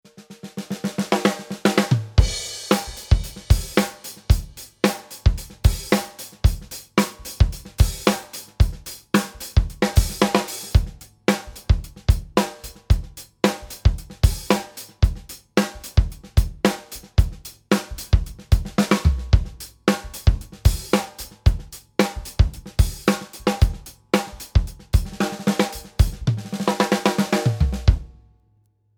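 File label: Starphonic aluminum, snare; Tama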